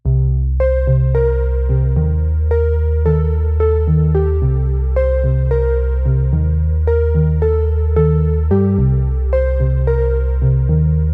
Dialogue music; the signal state soundtrack

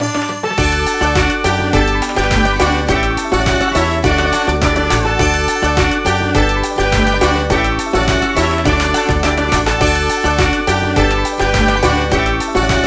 Bonustime; Cookie Run OvenBreak

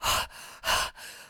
Running Loop; adams venture origins; Voices

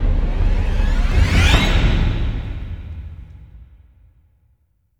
Combat Start Stinger; Battlefield 1

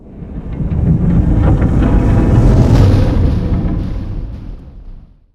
Whoosh

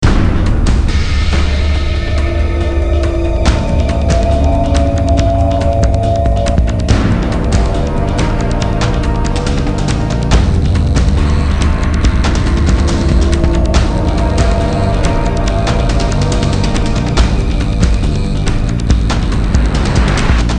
Music Action; Emergency Kids; nds; Music Action 02

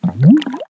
Object Exit Water

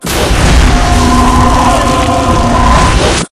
Flame sting; Doom 3

